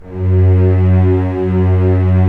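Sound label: STR SC, strings; Roland - LA Composer vol. 4; Full Sec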